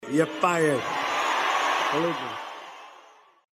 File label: fired